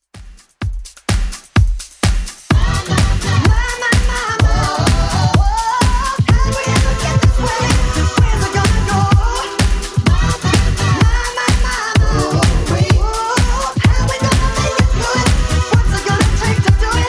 2ks Dance Redrum 127bpm

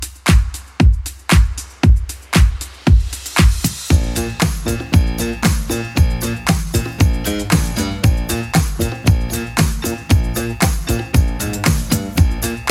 80s Club Edit 116bpm

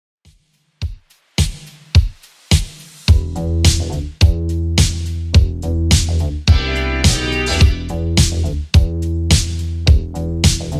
80s Redrum 106bpm CLEAN

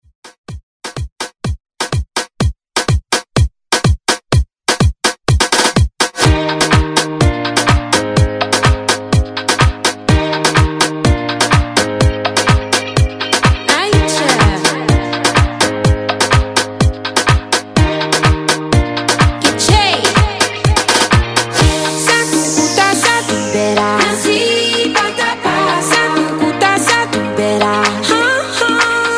Latin House Redrum

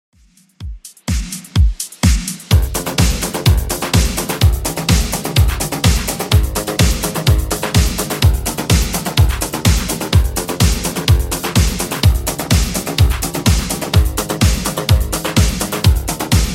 80s Redrum 126bpm

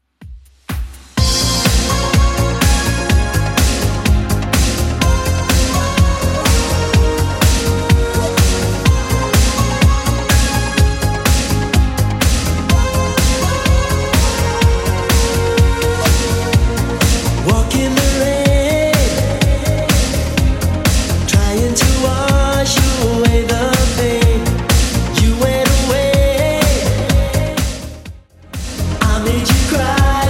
80s Redrum 125 bpm CLEAN

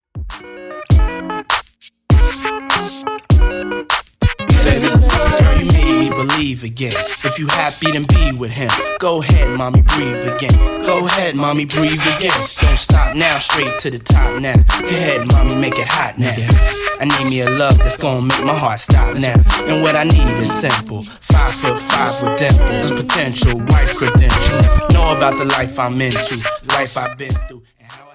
TRANSITION

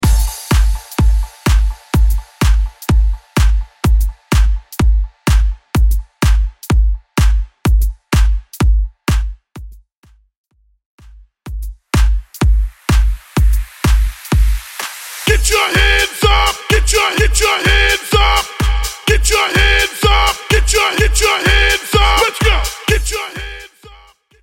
Future House; Mainstream VIP 2000s PartyUp Edit; Vegas Edit

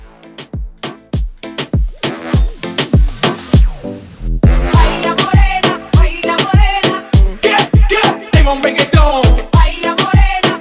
Moombah

